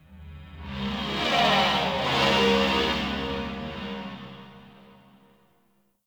Crp Ship Energy Moan Texture Med, Corpus Ship Ambience